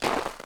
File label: icy walk; gcompris; reversecount